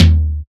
Tom SP; Drums

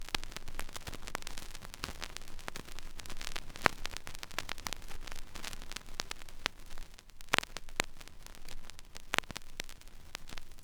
Crackle Vinyl Pop; Loops; Texture; Ableton Live 12 Lite; Core Library

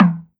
Perc Vinyl, Misc Percussion; Drums

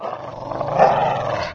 growl, dog; There; ThereClient

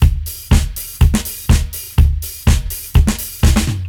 House Live 123 bpm, drums; Loops; Ableton Live 12 Lite; Core Library

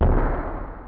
Bomb Blow; Weapons; Open Zelda; Quest Designer 2.0.4b

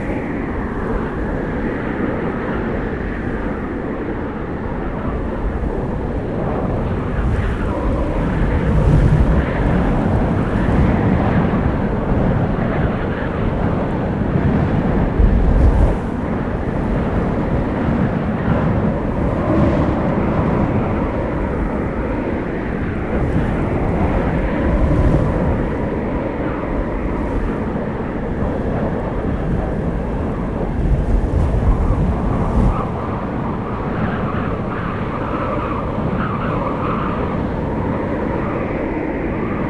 Mountain Cliff Wind Quad Loop; Journey

